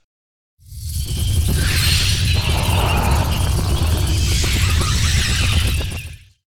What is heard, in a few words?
zerg building select; starcraft ii 2010